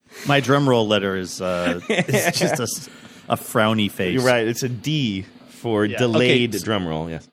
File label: drumroll frowny face